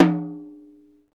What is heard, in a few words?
TOM HI, Roland; Club-50 - Foundations Roland; Naturals A